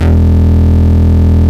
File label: Root E, Bass